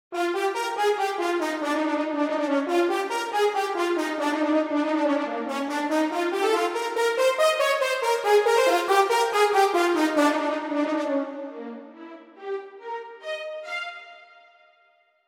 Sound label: Horn